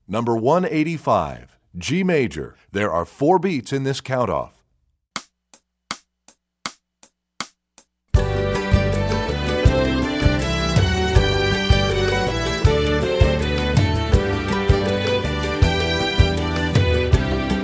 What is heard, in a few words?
G Major, Strings; EE2000 Book1